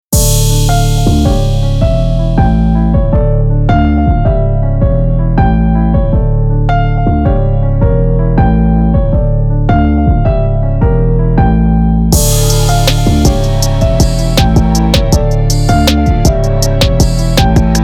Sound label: trap moves montage